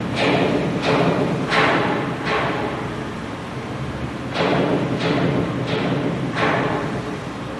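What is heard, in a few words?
Hammering Distant On Stage, warehouse & sound stages; Hammering, Distant, On Steel Drum In Warehouse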